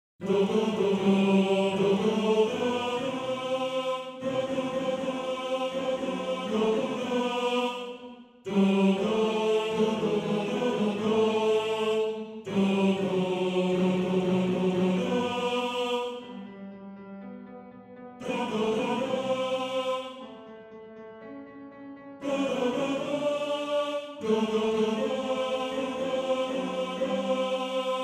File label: Tenor; Tenor 2